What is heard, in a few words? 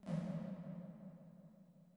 PERC, hi-tech kits; Crypton - DrumBase5000